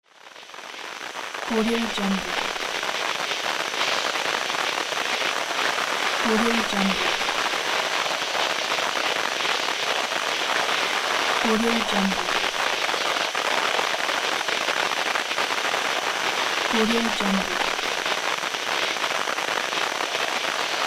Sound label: radio noise